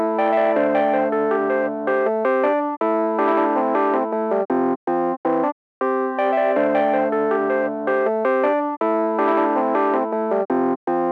epiano

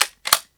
LOAD, gun shots; AKAI S-Series CD-ROM Sound Library VOL-6

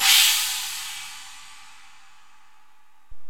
CYMBALS; OMI - Universe of Sounds

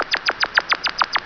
SUBMARINE; E-MU Formula 4000 Series Vol. 3; Analog Odyssey

